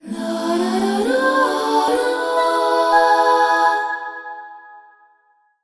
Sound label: Jingle Pact Forged; terra battle 2